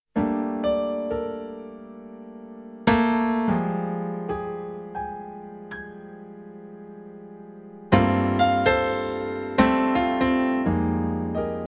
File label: Sax Piano